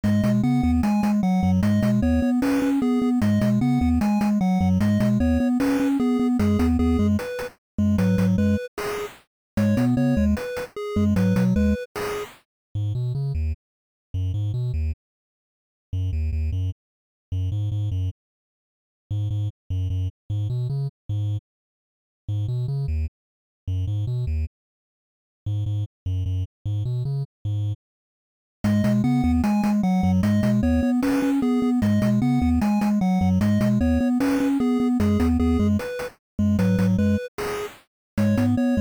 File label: Deep